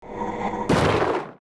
dolorian attack

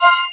hit; CD DIVINUS FLOS

